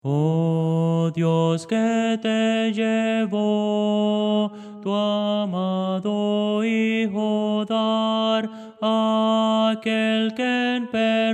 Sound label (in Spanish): tenor; Voces para coro